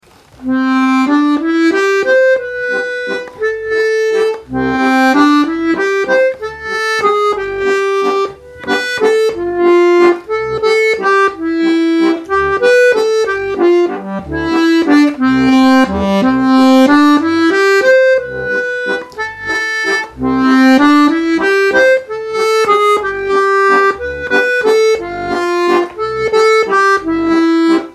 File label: Caroussel de Paris, Musette; 4-reihige Steirische Harmonika